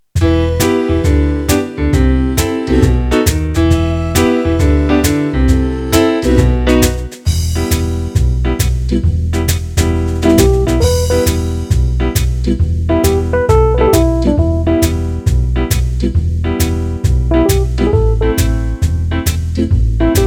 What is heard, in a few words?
Casio Doo Wop